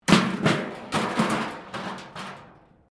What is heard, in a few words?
metal rubbish bin smash concrete; Materials